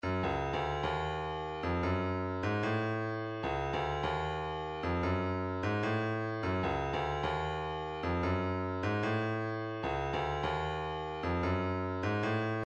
kick, midi

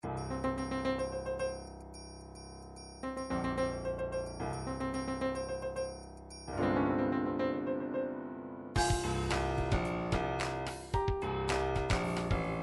triphop; Newtronic Triphop Construction Kit

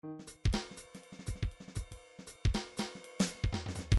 midi file fills bebop fills bebop fill

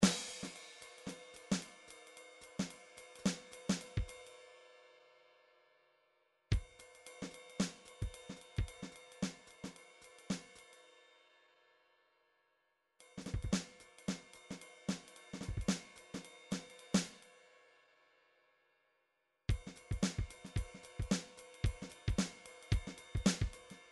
midi drums

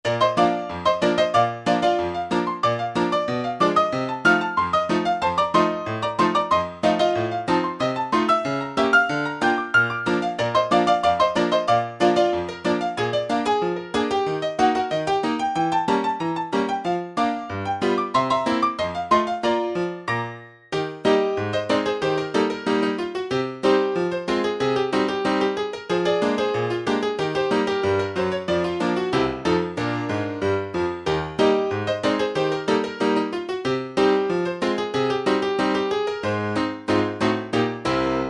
ragtime

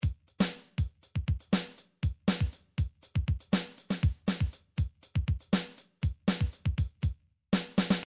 midi drums drums funk

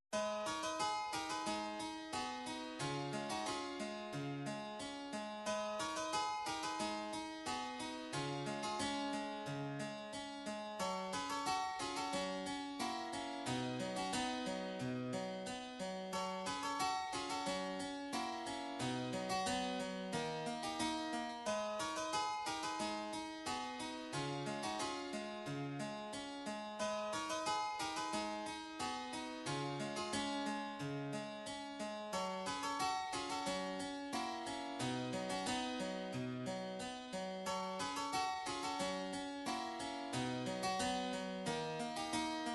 harpsichord; Game System Super Nintendo; Ultima VI

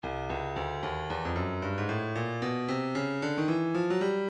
breakbeat midi, 113 bpm, housejam; Stylus Breakbeat Midi Files

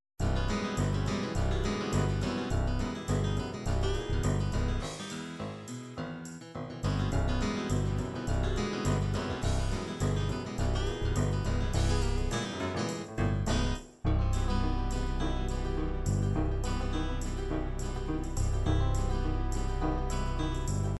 rock folk rock

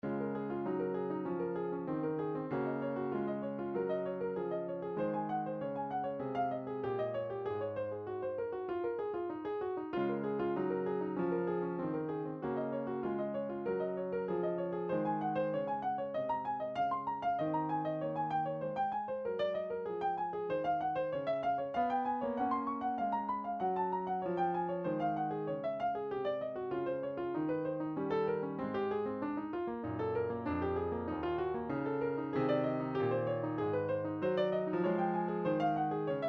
studies for piano, midi

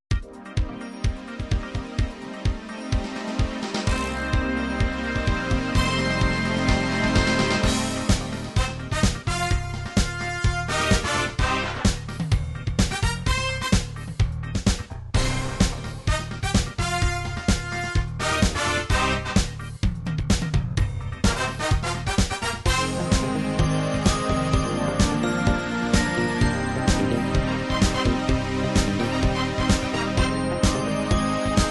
dance techno dance, midi

